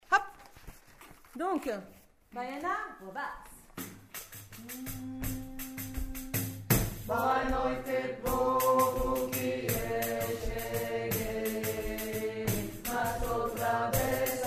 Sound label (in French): Baiana Basse